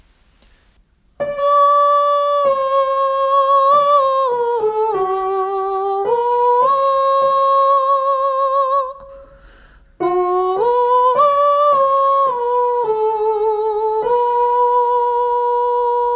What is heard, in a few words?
tenor; åfjord